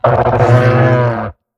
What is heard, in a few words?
Baryonyx sound